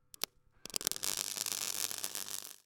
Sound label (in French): courant electrique; btp